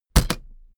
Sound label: suitcase hit empty case